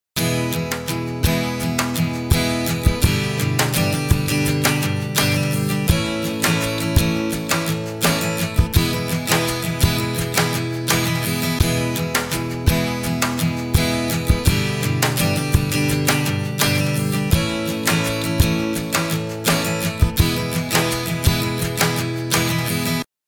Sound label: Stereoizer Guitar